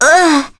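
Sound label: Vox Damage, Korean; kingsraid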